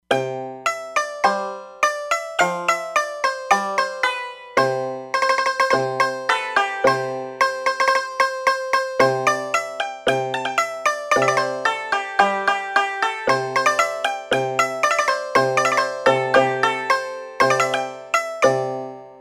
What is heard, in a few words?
Shamisen; Factory patches